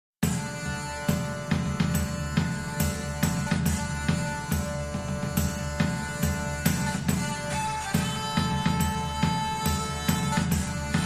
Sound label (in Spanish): Danza Medieval